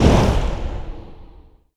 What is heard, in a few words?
Fireball impact, imp fireball; Doom